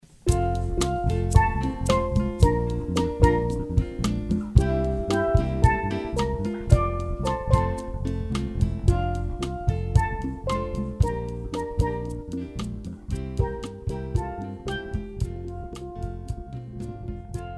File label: island jump